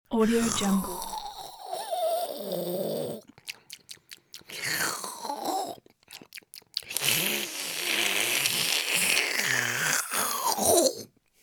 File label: cartoon long slurp drinking